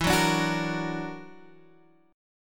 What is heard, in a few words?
Gb Augmented 7th E